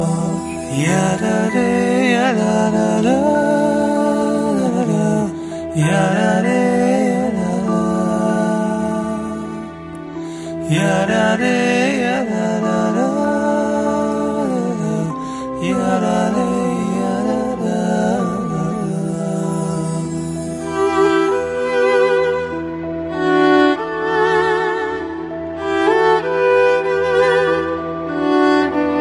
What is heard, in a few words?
Ringtone Singer